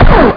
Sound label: Sound Blaster; LARTHUR'S LEGEND; battle